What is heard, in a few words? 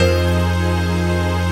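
chord pad, Pads; Best Service; XX Large - Pads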